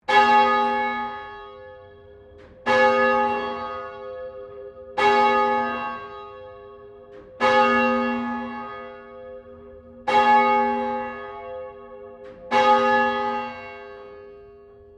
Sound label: Bell St Pauls Cathedral Melbourne Bell 2 Rings